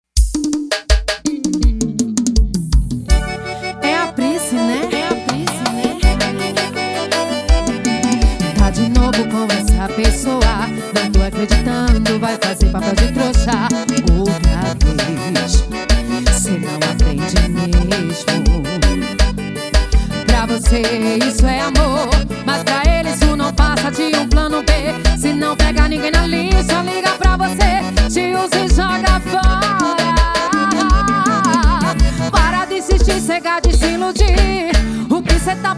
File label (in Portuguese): piseiro